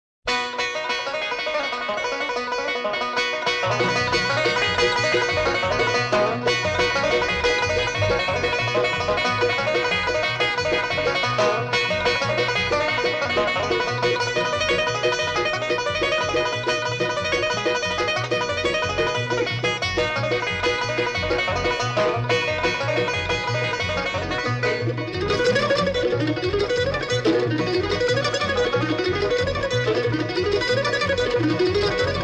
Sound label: Banjo